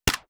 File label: Airsoft